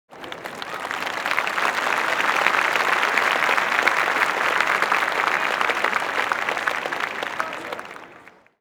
Hub Orleans Ambient; Levels Hub Orleans

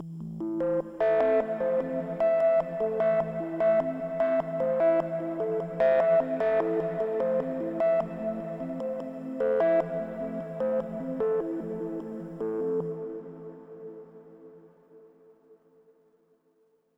Overlay Tone

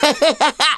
Emote Laugh; Target Hungryfrogs

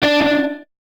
Guitar Note; Curse Crackers For Whom the Belle Toils

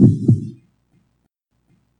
Heartbeat Loop

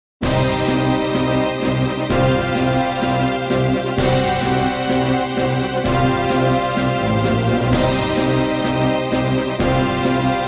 A Tense Atmosphere; Technosoft Game Music Collection Vol. 9: Voyage

